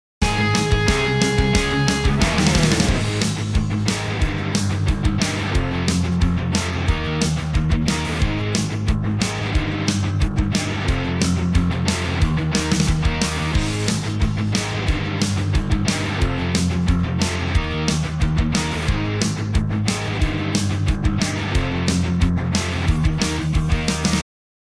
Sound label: vocal Off